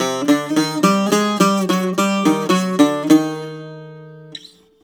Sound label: BOUZ C-1, bouzou